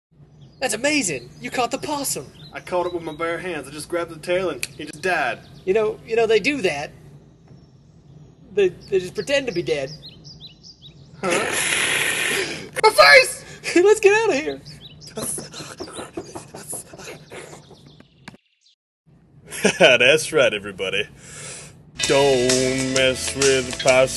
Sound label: possum song